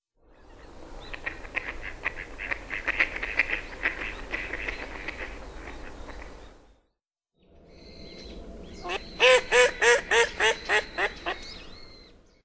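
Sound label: mallard, hyogo; koe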